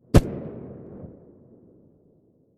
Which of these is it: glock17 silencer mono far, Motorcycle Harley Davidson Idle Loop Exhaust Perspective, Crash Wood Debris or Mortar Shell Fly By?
glock17 silencer mono far